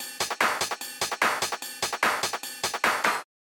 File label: perc hardstyle clappy; drum loops